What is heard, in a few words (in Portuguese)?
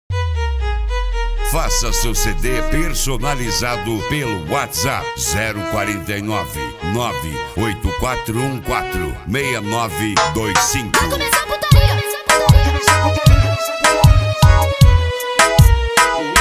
som automotivo especial reggae e bregadeira